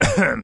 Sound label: ahem, human; Voice; ooh ahh